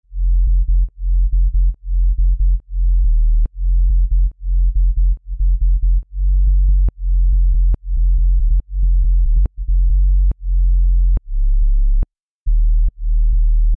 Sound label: Sub Bass